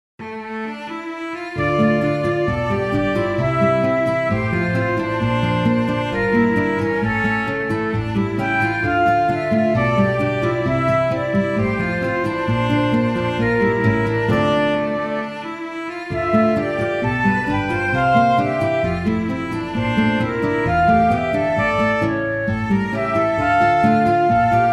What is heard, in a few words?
Romantic Song